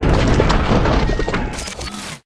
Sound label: space platform tile build, factorio sound terrain